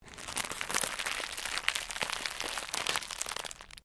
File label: Cellophane crinkle; Material components for constructing more complex sounds; Plastic; Sound Effects:Materials